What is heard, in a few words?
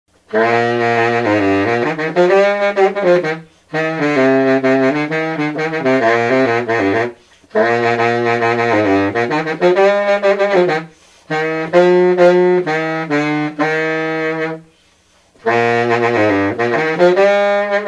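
Martin Silver Baritone saxophone, B flat key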